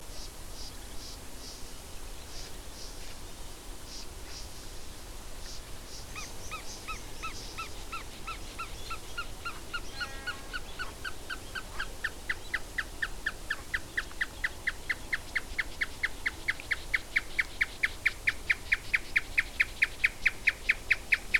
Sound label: Marsh tapaculo